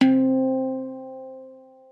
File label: hang